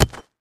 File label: Concrete Chunk Drop; rocks & dirt